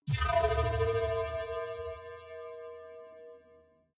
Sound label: energy field vanish medium